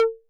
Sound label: Conga Hi 808 Saturated B